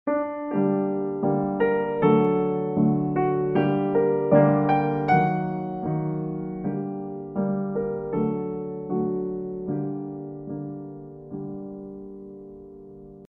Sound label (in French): theme piano solo